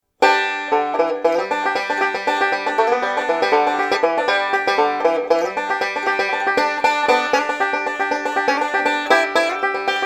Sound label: gibson bow, banjo